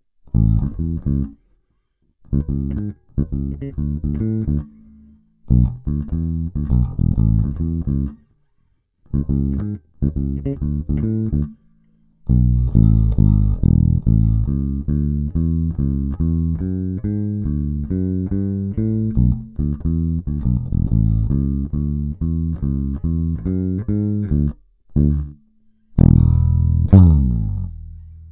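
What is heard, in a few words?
750T - White nylon, bass; La bella; Rotosound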